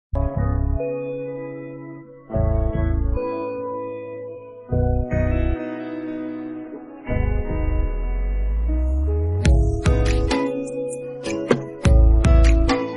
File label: Instrumental Ringtone